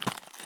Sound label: walk tile; Escape from Tarkov